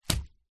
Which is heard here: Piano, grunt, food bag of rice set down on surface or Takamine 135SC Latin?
food bag of rice set down on surface